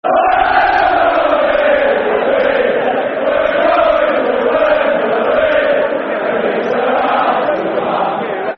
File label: Football Chants (Soccer Song); Chelsea Cfc